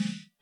Black Beauty Snare Ghost Notes Unprocessed; Ludwig

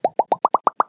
Bubbles Rise

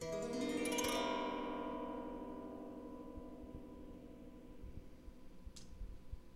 Strings

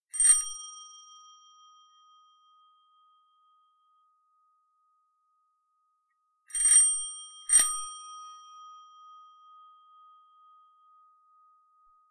Hotel Bell Tremolo

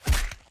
Strong Kick

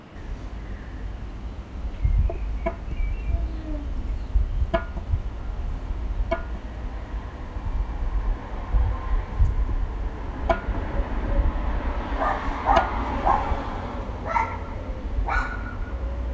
Windy Day In Calm City

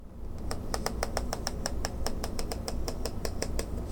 Slowly Strumming Plastic Cups

